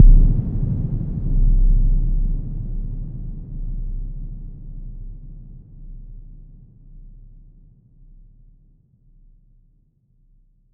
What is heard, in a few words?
Cinematic Boom